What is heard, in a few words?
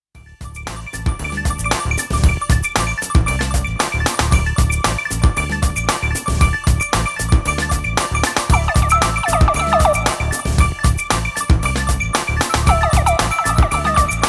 Pro 12 Funky